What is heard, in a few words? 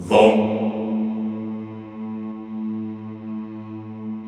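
Vohmm Houuu, Chorus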